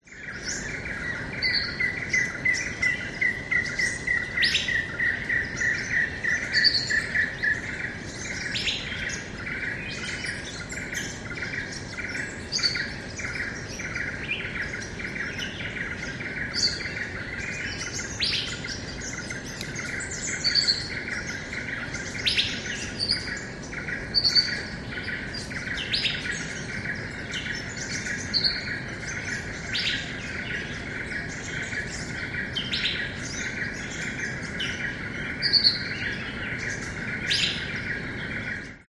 forest amb short birds distant river cat tien national park vietnam